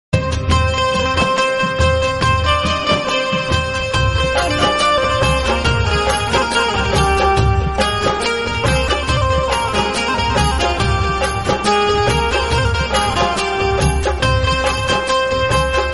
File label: instrumental ringtone